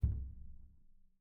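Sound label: pedal Up, Grand Piano; bitKlavier Grand; Piano Bar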